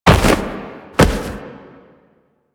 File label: Downed Fall A, Foley; Enemies; Warframe; Teshin; DE